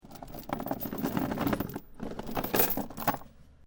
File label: Heavy Chains Rattling On Wood; LYN104 package of Chain sounds